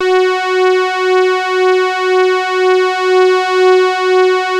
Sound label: TARK SAW, Synth